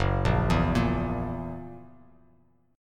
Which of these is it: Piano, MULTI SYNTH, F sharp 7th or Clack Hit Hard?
F sharp 7th